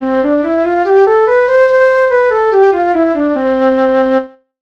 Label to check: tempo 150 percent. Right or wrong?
Right